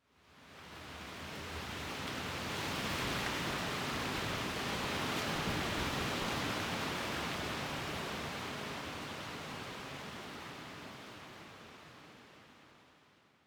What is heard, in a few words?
Wind Tree, ambience